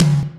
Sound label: tom, drums; virtual drum sounds; custom drums